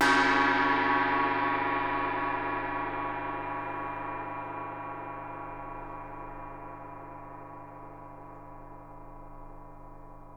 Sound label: GONG, Percussion; Miroslav Vitous - Percussion & Harp